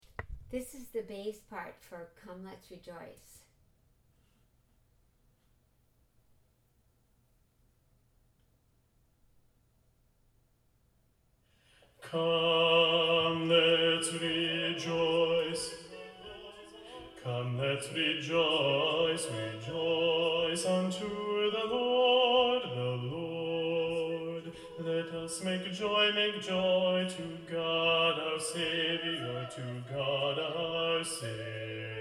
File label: come lets rejoice bass